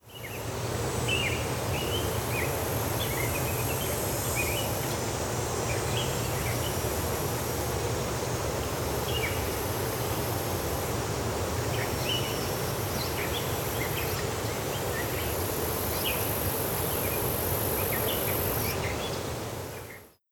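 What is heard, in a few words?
Mainz Farm ambience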